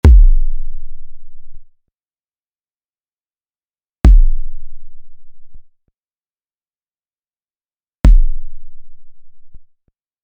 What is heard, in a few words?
kick